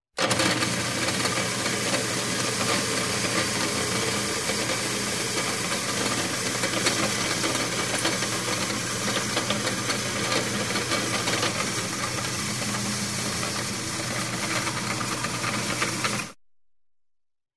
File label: traffic